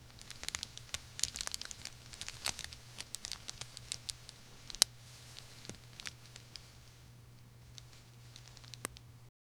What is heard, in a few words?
close up foley recording, clothing